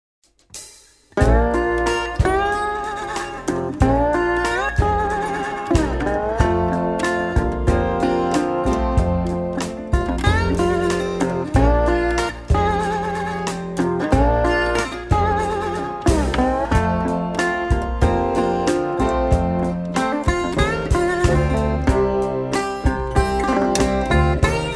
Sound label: blues